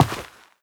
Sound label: step, footsteps, player, carpet